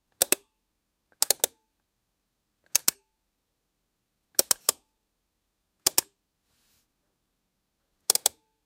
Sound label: click sound like ipod whe; selection